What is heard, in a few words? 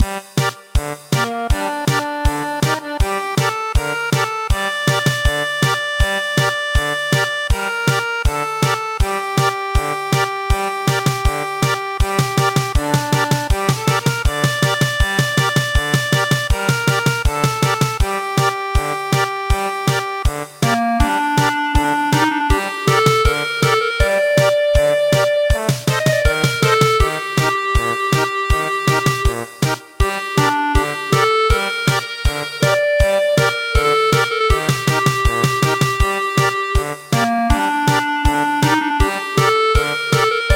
German Polka; The Nanbu Cow Driving Song